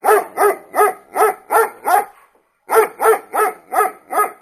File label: Dog German Shepherd Barking Sounds Car Horn